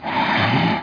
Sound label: snore; dogz